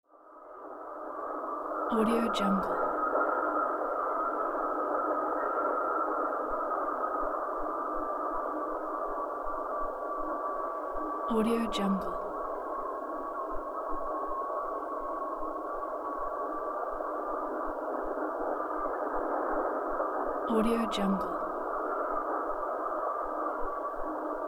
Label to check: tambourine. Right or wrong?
Wrong